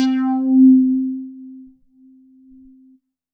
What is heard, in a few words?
C4, Synth Line; 90 s Sample CDs; NiceBeats; SynthLine VOL-18